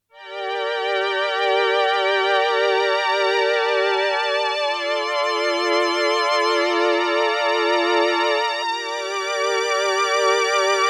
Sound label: Behringer Pro 800 Tape Violin